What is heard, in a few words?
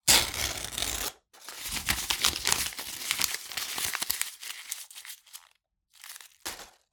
Book Paper Rip Scrunch Pile